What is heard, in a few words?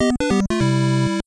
bonus chance lose; super mario bros 2 nes